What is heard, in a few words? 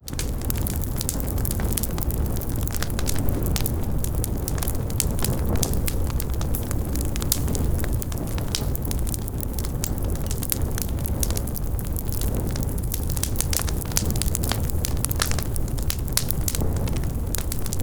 FIRE BIG CRACKLE Fire sound effects